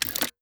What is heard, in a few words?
SFX piano puzzle frame move; through abandoned the underground city